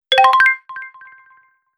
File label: coin